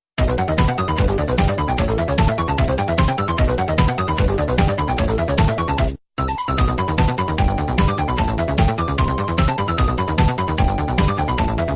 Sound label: MUSIC Boss BGM; Dyna Blaster